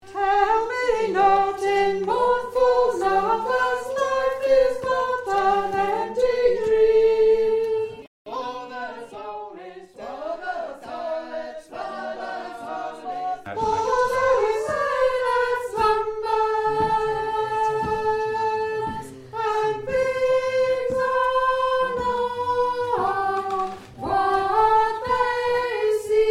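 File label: Soprano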